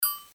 turntable game

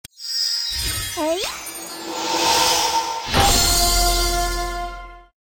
recruit action

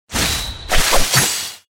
attack; hero sound

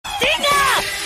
miss

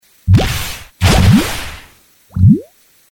attack; hero

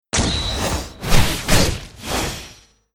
attack; hero

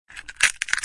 smash egg

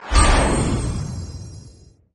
buff, battle

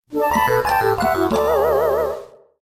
battle false

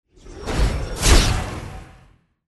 attack; hero

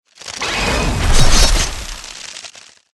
attack; hero sound

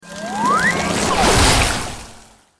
attack; hero sound

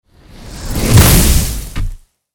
attack; hero

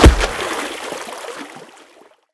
water, battle

